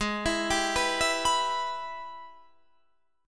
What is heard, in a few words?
G sharp Minor Major 7th Sharp 5th G sharp down